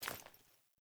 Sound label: Foley Movement Armour; Characters; Enemy; Melee Grunt; Sinecure